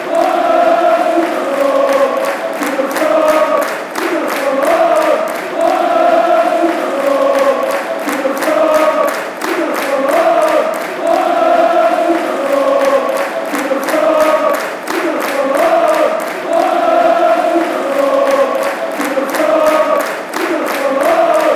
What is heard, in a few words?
fanchants